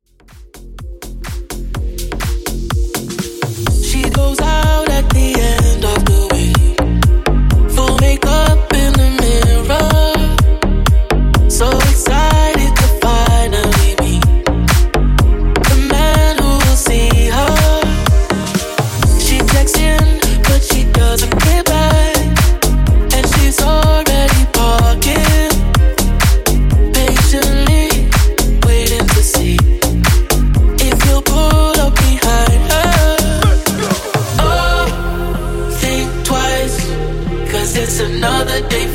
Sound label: 125 Bpm; Club Bootleg Edit